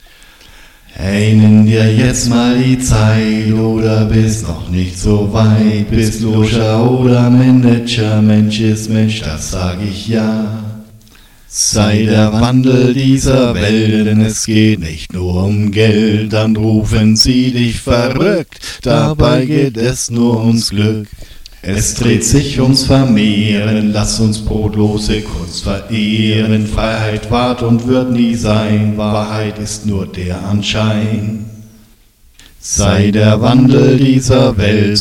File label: acapella